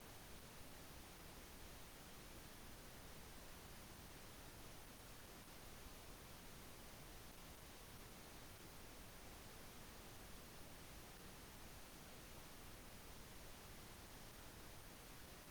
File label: pink long quiet, colored noise